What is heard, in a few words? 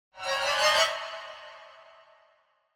Symphonic Hit Going Up Violins; Hollywood Edge; sound designer's toolkit v2